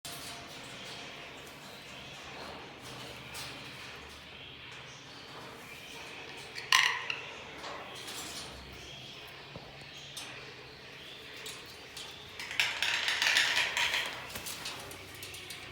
traurnyi kakadu benksa, parrots, calyptorhynchus banksii

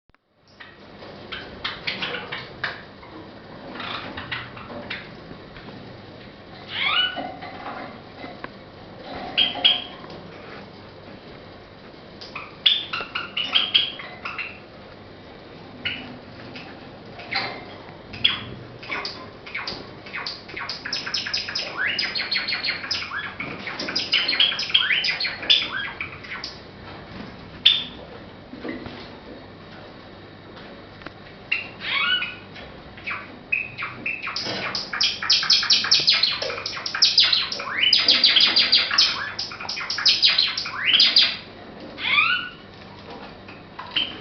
pesnya risovoi amadiny; padda oryzivora

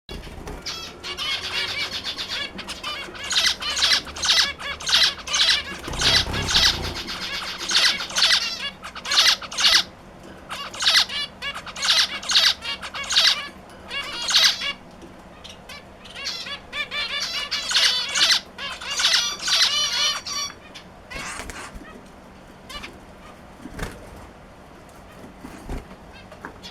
zebrovyi amadin poet; lonchura striata domestica

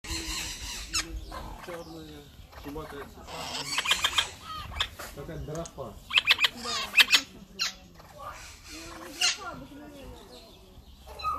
veernyi popugai deroptyus accipitrinus

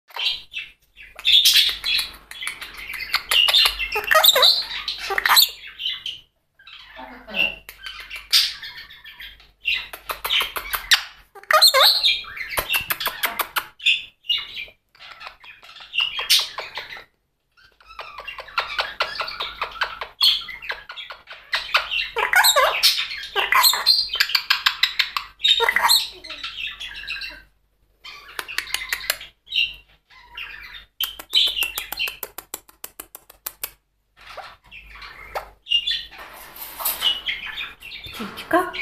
kubinskii amazon obucenie razgovoru; amazona leucocephala

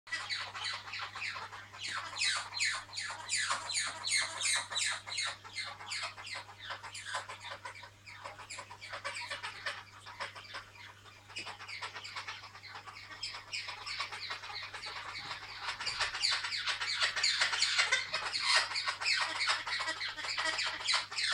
sinegolovyi cernouxii brazilskii popugai pionus, parrots, pionus menstruus

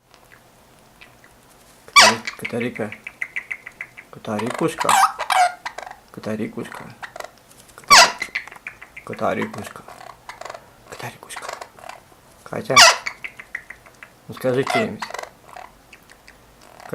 popugai belogolovyi amazon kubinskii amazon; popugai bagamov popugai kaimanovyx ostrovov

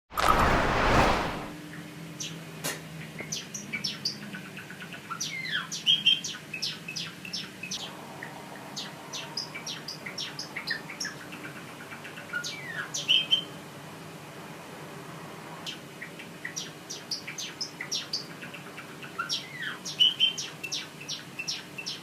pesnya risovoi amadiny; padda oryzivora

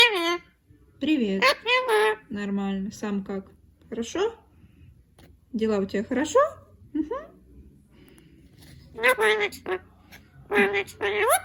govoryashhii ozerelovyi popugai; psittacula eupatria

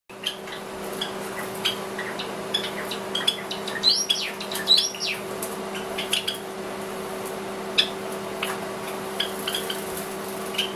risovye amadiny; padda oryzivora

